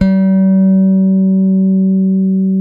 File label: PRECISION BS G#4, Bass; Spectrasonics; Bass Legends